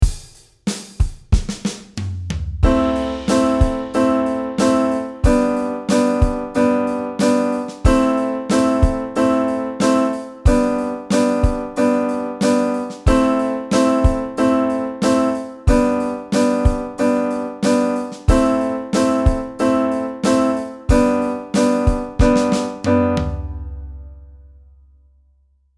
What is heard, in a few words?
a min to g major backing track